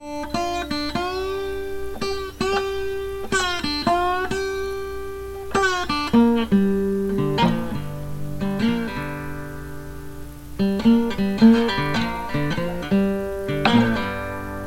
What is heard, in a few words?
Mississippi Hill Country Blues